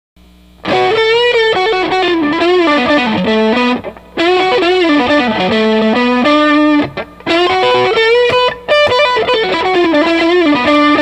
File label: strat front; STRATフロントピックアップ; アンプは、JCM900コンボの クリーンチャンネルを使用しています。; Monkey Drive; FenderMEXのテレキャスターDELUXE